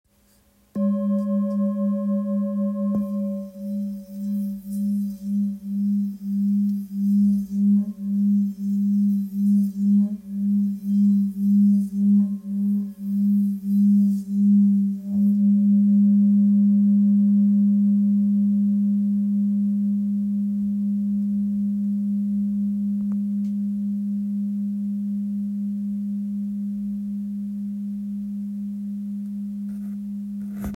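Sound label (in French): Ancien Fa dièse 675g, Bol Chantant Tibétain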